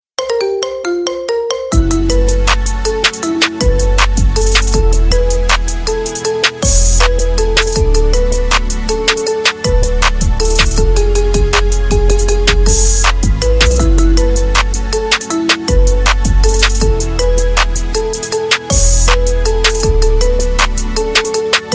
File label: marimba remix